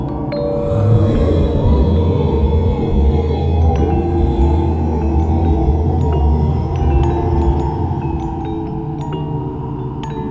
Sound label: jam loop